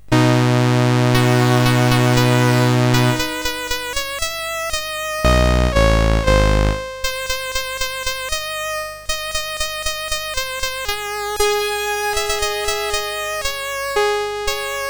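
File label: Recitative and Duet, MOS8580; Mabel and Fred; CSG8580R5; Pirates of Penzance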